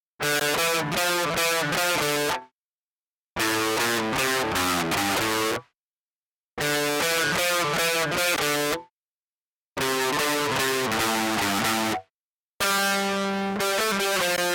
g1 solo ampli

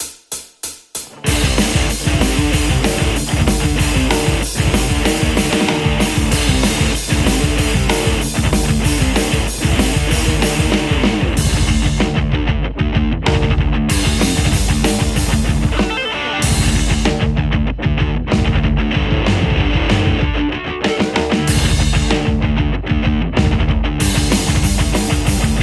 metal Les Paul soldano x88

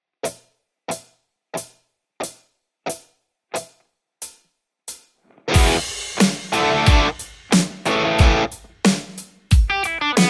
tele legion; poulin

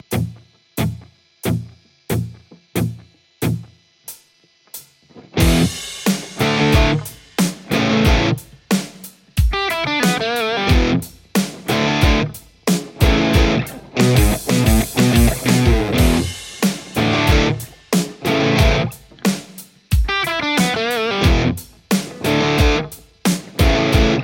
les paul; mercuriall

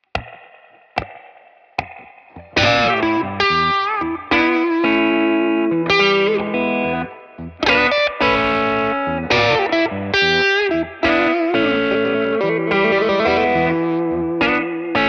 blues tele legion; poulin

